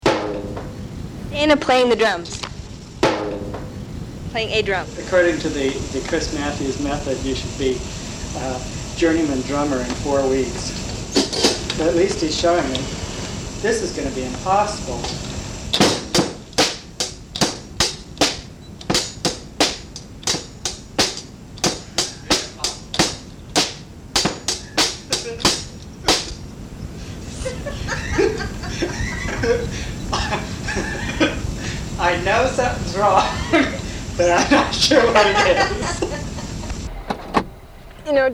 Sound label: DRUMS